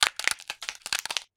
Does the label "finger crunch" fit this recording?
Yes